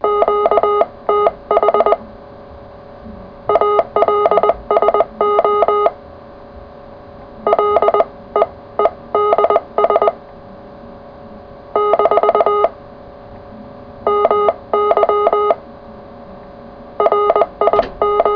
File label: cw qso